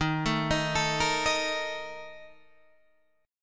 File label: A sharp Major 7th Suspended 4th